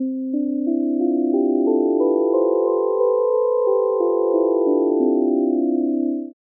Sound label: melody plain